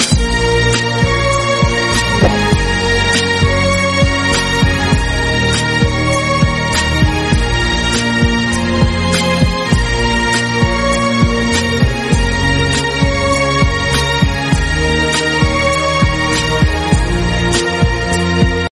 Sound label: Bgm Romantic, ringtone